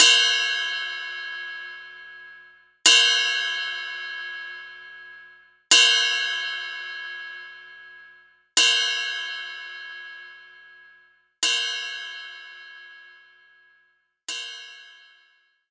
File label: Ride Bell; knights try